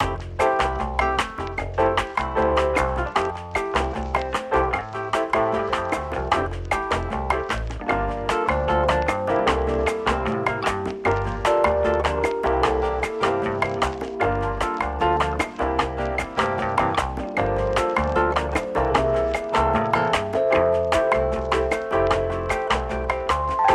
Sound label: Bossa Bossa; Two Dots